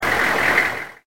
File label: diesel